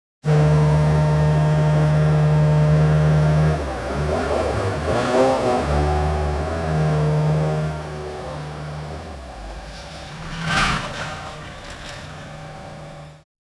car polishing sound; home improvement

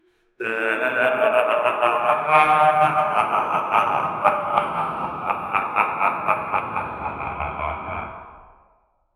maniacal insane laughter, asylum

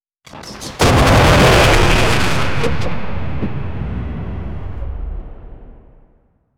explosions ship asteroid

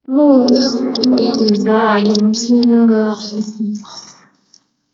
disgusting feeling of cor, disgusting

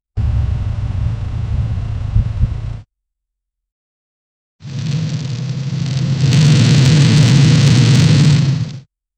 vehicle exhaust backfire; firearm

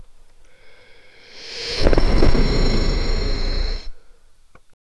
a deep heavy breathing so

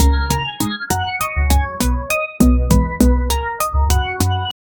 playful techy loop

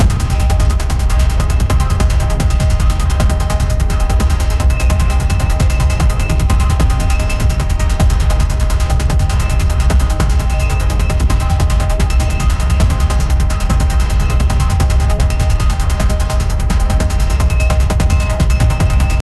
pad fast paced thumping, loop

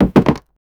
dry sound of breaking woo

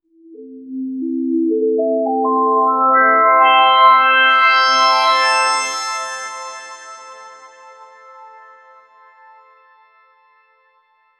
introduce a brighter elem, uplifting

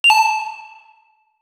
item pickup sound, lofi

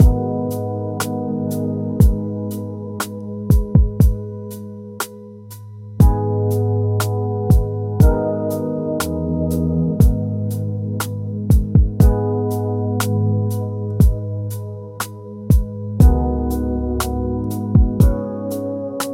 lo fi track in, acoustic guitar

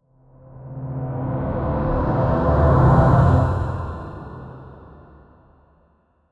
dark magic awakening

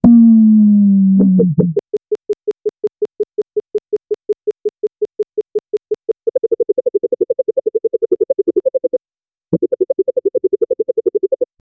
a comedic bounce followed by a funny boing noise

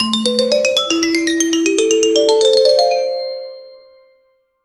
a cheerful xylophone jing

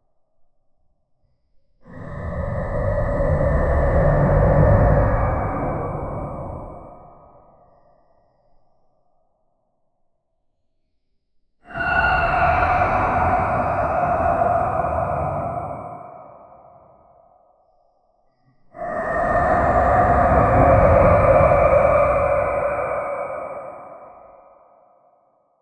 monster

